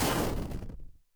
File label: retro game explosion